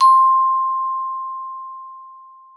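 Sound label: GLOP C4, glockenspiel; Xsample VOL-4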